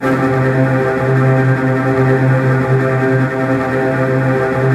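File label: STR TREMOLO, Virtuoso Strings, C2, right; ILIO; Kirk Hunter Virtuoso Strings VOL-3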